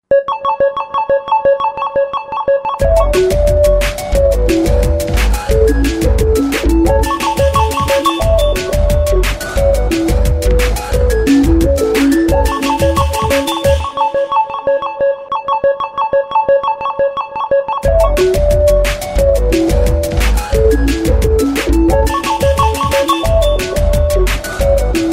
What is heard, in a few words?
Ringtone nokia dance